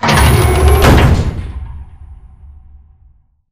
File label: wall run panel move; beacon; Titanfall 2